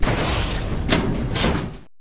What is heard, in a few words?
ui open Strongbox